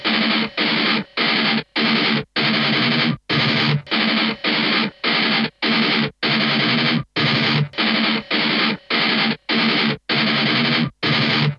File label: Triaxis Lead